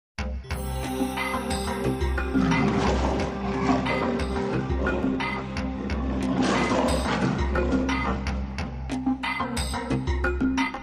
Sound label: Disjointed Animal Sounds Foreboding